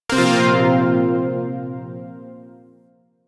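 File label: Shawzin Pentatonic Minor Chord K; Zariman Shawzin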